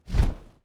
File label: Devil Sword Move; Karda Mahal Underground Temple